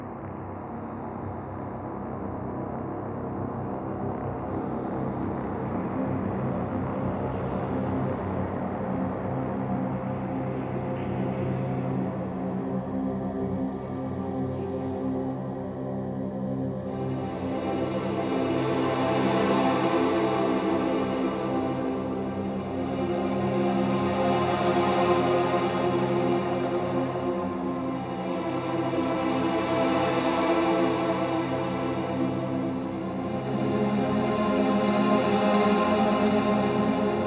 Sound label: Post Transform Cue; Entrati; TennoCon 2023